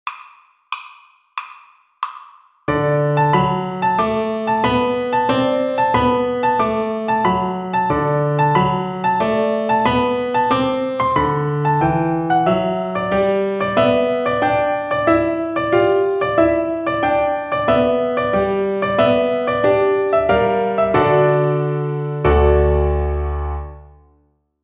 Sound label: String Gym, Piano Accompaniments; Etude 15 - String Crossing Blues in Harmonics